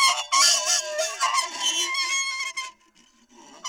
ronk, science fiction; E-MU Producer Series Vol. 3 – Hollywood Sound Effects

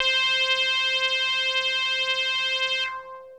Techno leads, hits and riffs

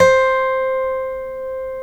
STEEL C, FOLK STEEL Guitars; Spectrasonics; Hans Zimmer Guitars VOL-1

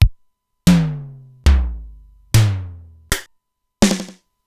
Simmons SDS-9 Factory B5

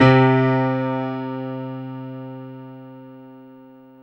PIA MICRO, MICRO-PIANO, Roland

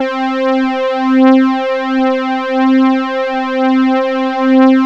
poly synth; Sound Gallery - Charged; POLY SYNTH 1

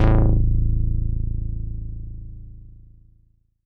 Fatboy Relaxed a1, Korg Poly-800

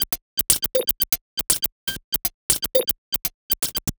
Odd Glitch, 120 BPM, Percussion

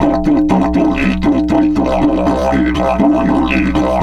120, Didgeridoo

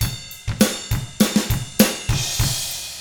ROCK, Drums; ILIO; Double Platinum Drums 1

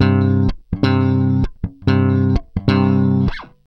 Groove Pluck, Bass; Bass X

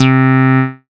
synth c, Euro Style; Best Service ProSamples vol.37 - Dance Synths

